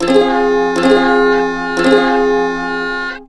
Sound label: play sad; birdly